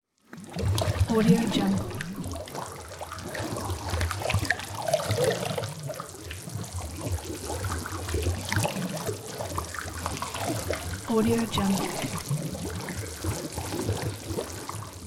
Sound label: stirring water in a swimming pool